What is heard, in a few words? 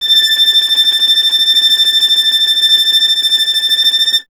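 string violin, Vln Trem; Miroslav Vitous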